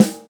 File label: dry steel snare drum A key; snare-drum-samples